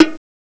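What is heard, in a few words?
bongo; CMI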